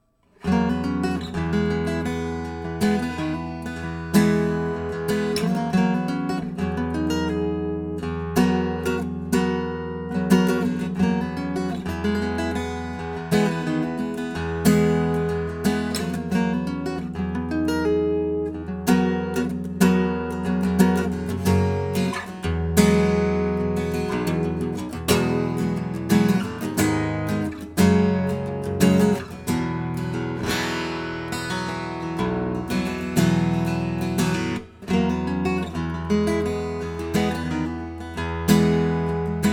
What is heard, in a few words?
baritone, guitars